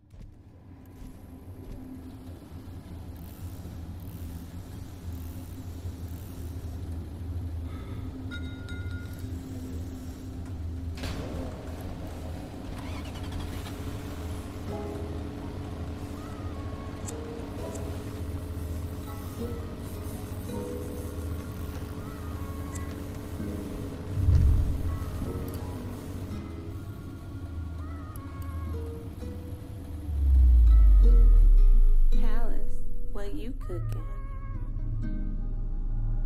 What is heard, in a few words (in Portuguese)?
graves bass